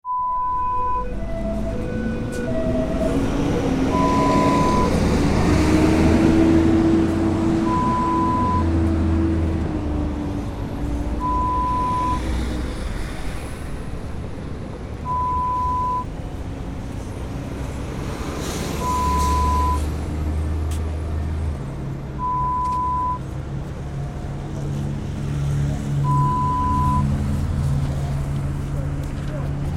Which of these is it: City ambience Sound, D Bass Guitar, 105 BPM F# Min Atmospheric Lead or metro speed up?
City ambience Sound